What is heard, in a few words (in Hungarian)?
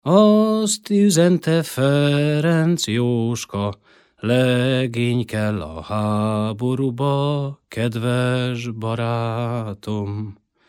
nepdal; azt üzente Ferenc Jóska